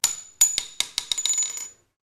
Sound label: shells 9mm; izanagi 2022